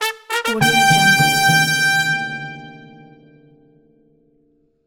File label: winner brass fanfare